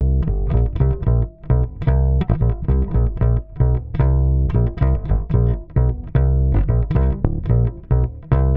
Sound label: 112 Cm DISCOELECTRO BASS; 112 BPM